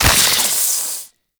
Modular Secondary Barrel Beam Attach; SU Modular Guns; Barrels

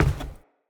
Step Wood, Footsteps; Enemy; Characters; Corrupted Sproutfolk

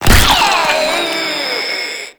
Inked Humanoid Death, Enemies; Warframe; Shadowgrapher

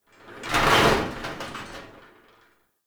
Exterior Tractor Metal Groan; Ambience; Props; Warframe; Duviri

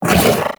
Companion Melee Swipe, Pets; Warframe; Characters; Zanuka; Foley

